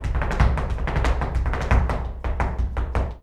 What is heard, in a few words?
Flamenco, Tapping, Zapateado Solo; Best Service ProSamples vol.46 - Flamenco